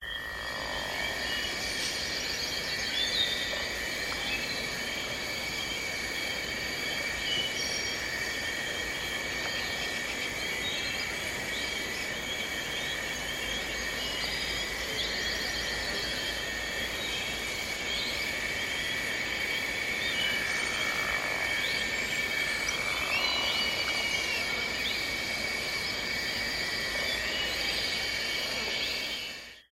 Jungle Atmo Canyon; darkstar one; windows xbox 360

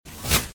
Skull Shoot; Doodle Jump; 3DS, Android, Arcade, DS, iOS, Mobile, Xbox 360

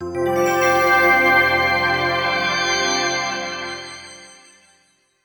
Start; Separate Hearts; PS2

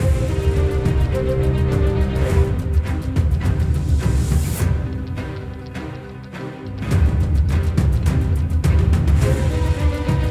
Combat Normal; BIOMUTANT

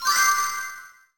Jingle; Garaku Tale

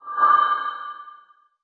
Music Feedback; Music Feedback Corps 01

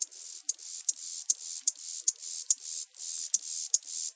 Amb Bug; Voices of the Void

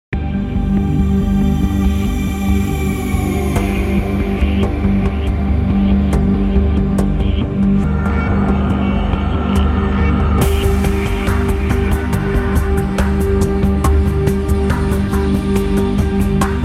Production Music; Threat Level; Invicta Beam

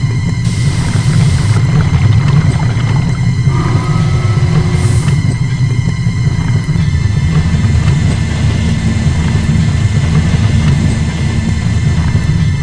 Plant Ambient; Gore: Ultimate Soldier

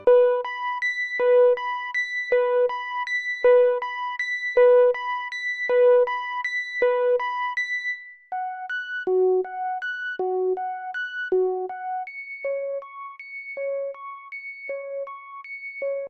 Piano; Piano Cat; Plasma Island

